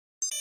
2 Tone Beep. High Pitched Computer 2 Tone Double Beep.; beeps